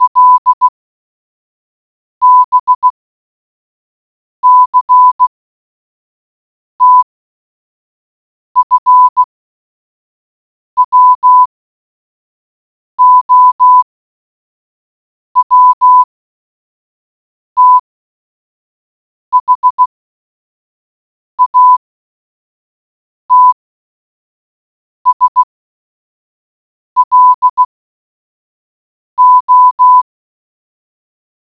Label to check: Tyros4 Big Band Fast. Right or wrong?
Wrong